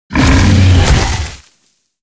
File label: creature attack leap; snowstalker